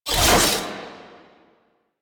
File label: Whoosh, Warfan; Warframe; Tenno; Broken Frame; Quassus Prime